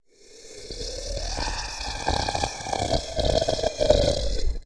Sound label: monster breath; breathing